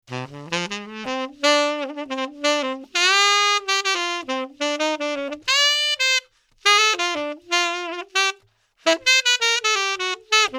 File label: Sax; Gottsu